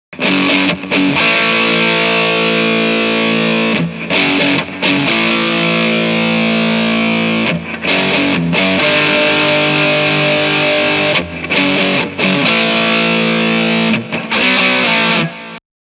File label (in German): fullgain steg rhythm; myPaula